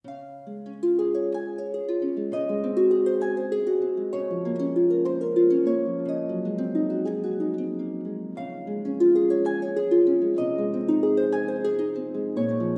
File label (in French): Chanson de Mai, Harfe